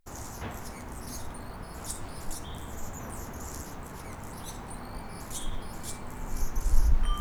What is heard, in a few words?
singing